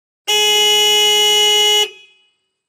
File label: Montero Horn Long, 1998 mitsubishi montero ext.